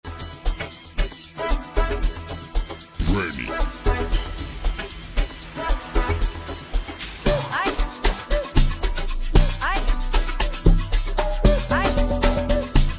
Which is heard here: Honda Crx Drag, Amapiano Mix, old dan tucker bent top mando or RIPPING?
Amapiano Mix